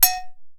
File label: BULU SINGLE; Best Service; World Colours